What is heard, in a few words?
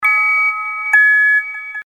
TONE